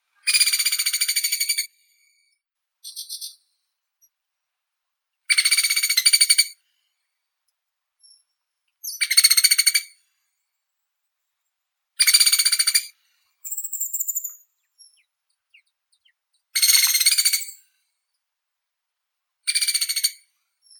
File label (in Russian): dyatel rizhebruhii dendrocopos hyperythrus